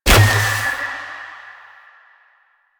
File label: Choir Bow Fire; Warframe; Weapons